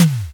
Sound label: hit squelch